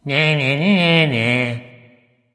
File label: tongue; smite; ganesha